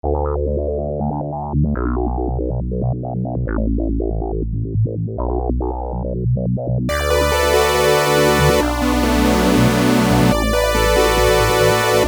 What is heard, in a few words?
Fast Techno